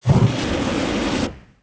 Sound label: balloon; Ambient; MX vs ATV Unleashed